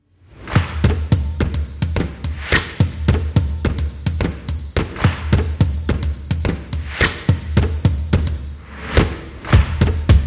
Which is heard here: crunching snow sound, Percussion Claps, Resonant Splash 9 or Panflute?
Percussion Claps